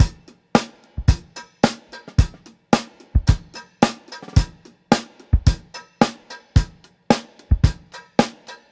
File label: 110 bpm drum beat c sharp key